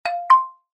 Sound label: completed; session-android; webrtc; Beta support for webrtc video and voice calling